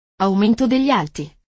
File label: Treble Boost; it